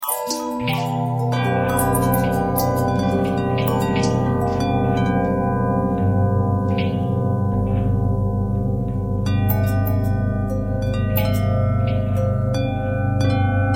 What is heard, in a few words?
bell long bell harmonic twist; bells